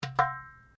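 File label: Drum Darabuka Edge piano, Egyptian Darabuka Drum; Commanly available Egyptian Darabuka Drum; Drums; Sound Effects:Musical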